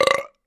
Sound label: Belch Deep